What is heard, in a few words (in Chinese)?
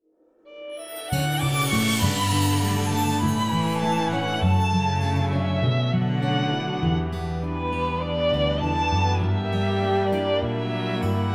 提琴铃声